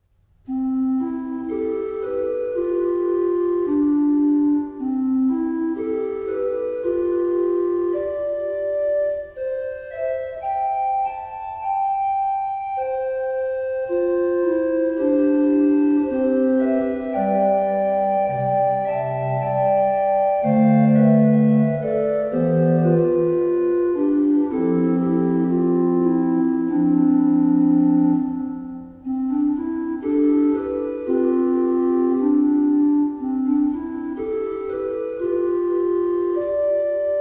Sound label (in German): orgelvorspiel; Gottheit tief verborgen